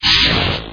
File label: lich attack; middle low